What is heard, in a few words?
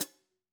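Hats Pedal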